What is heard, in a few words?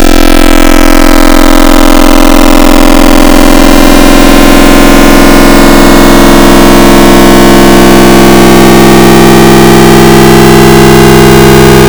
Split A Square, FM Wavetables; Cymatics; FM Wavetables Vol 3; Serum